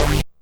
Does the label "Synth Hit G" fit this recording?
Yes